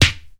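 smooth snare drum sound a key, tuned to the A note; Duration 0:01; Samplerate 44100 Hz; Channels Mono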